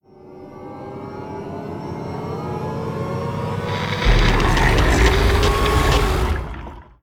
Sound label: capture portal; ingress